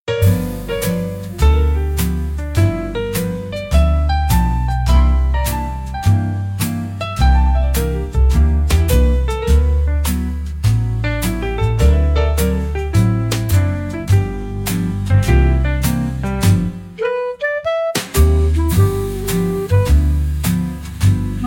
Midnight Sax Stroll, smokey night jazz

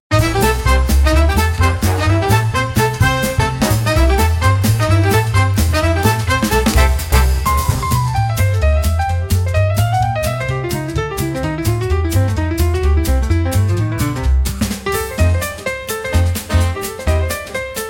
Moonlit Brass Parade, big band swing

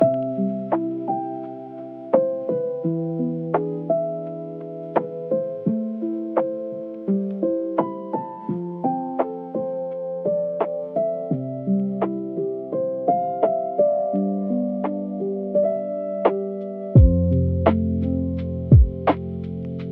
emoi lofi piano for recall and nostalgia

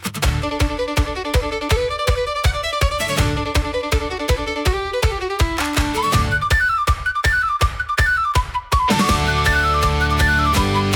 Barley And Fiddle, irish pub rock

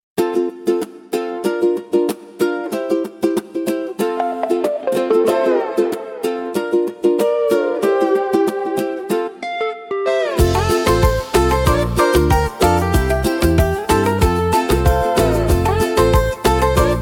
ukulele tropical for bright and light

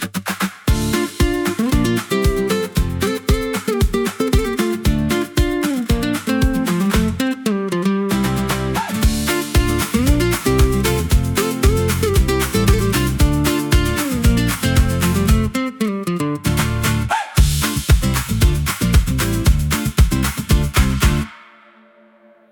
Bright Morning Dash, whistles

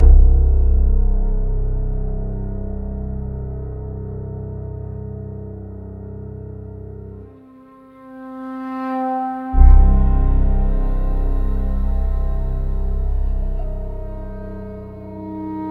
spiritual spooky soundscapes

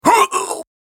"Ugh", samurai voice; samurai voice selection 2

